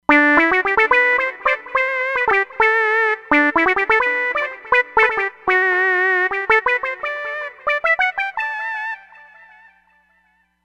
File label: Mew Lead